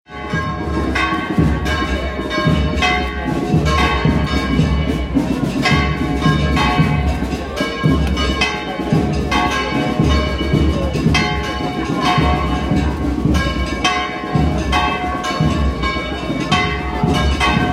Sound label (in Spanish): Iglesia de San Jose Parroquia de Santa Maria, campanas